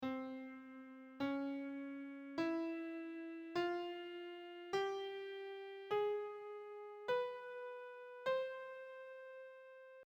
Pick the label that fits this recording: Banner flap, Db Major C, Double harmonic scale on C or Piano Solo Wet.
Double harmonic scale on C